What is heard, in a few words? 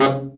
BRA Bari, SAX; Best Service Gigapack 1; BR SAX 2